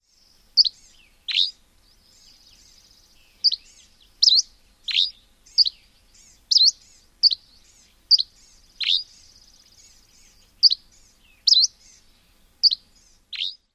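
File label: song, Dusky Flycatcher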